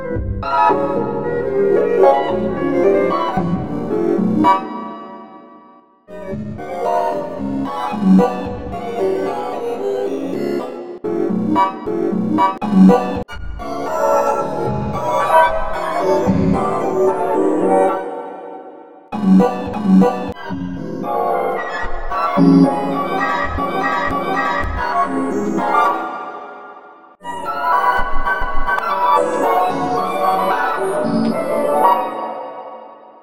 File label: tone